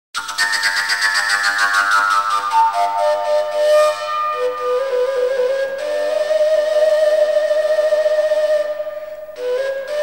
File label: traditional, fujara